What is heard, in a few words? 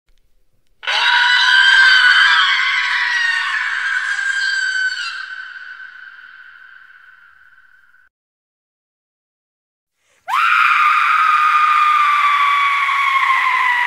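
suoni horror effetti sonori horror sounds scary sounds creepy horror ai sounds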